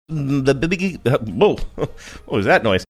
making some funn mouth sounds saying what was that noise